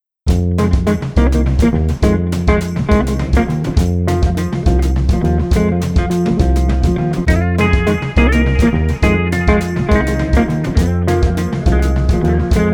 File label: Blues Jazz 120